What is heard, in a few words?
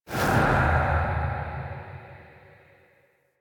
UI Customization Menu Close; Warframe